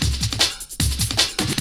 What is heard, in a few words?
Beats, Breakbeats, Techno; Syntec; Wall of Sounds VOL-2; TECHNO-BOX; 90s sample CD